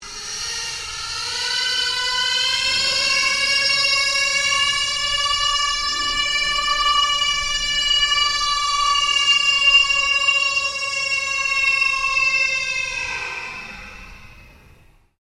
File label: Ambient Drone Ghost Chatter Scream; Sound effects created by manipulating other sounds; Scary Soundscape Sound Effects